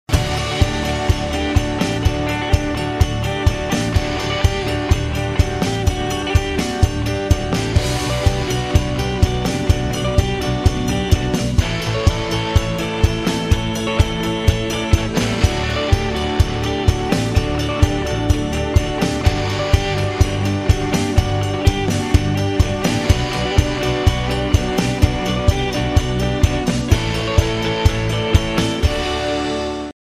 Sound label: power of rock